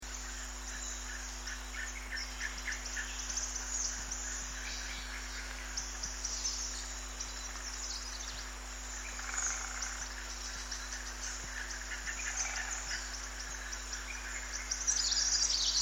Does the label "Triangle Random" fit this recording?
No